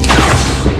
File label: Phase Pistol; trek